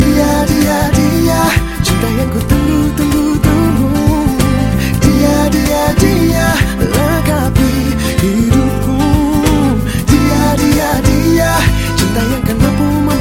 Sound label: Afgan Music